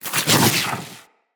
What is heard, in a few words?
Root Spider Mother Web Shot Proj Whizz By; Animals; Spiders; Abilities